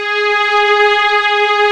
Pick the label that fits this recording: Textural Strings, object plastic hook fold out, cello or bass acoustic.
Textural Strings